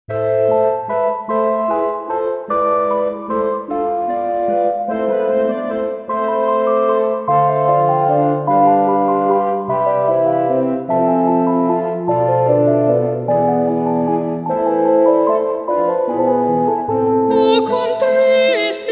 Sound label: Boys Choir, O Quam Tristis; Vivaldi; 外文宗教歌曲